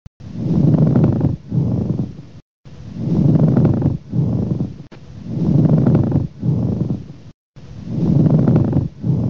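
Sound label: Pleural Rub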